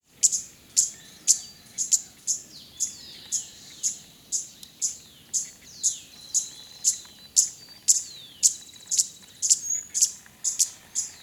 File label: Sparkling Violetear, birdwatching